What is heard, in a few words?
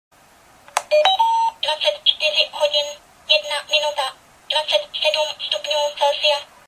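alarm clock; budík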